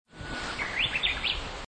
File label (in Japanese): uguisu